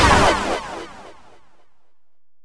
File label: Retro Electronic Burst Stereo UP